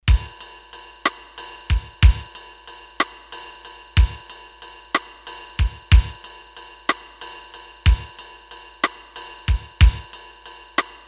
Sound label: Ballad, Roland D-10; Rhythm Demos